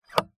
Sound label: household lock metal turn click contact mic